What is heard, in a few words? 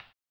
HAMBONE; ILIO; Vocal Planet VOL-2; Blues & Soul